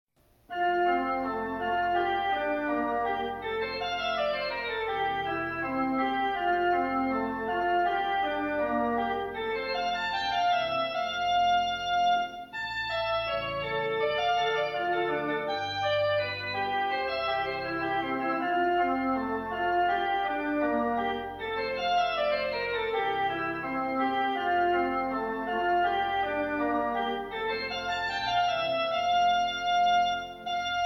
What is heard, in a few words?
Whistling In Praise With A Happy Heart; organ